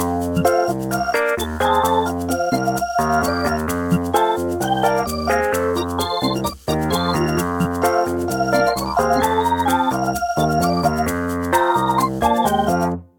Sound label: Blues